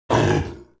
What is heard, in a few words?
Panther Fort Vox; Civ4 warlords